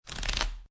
Playing Cards Shuffling Cards Flipping Onto Table Short; Card Sound Effects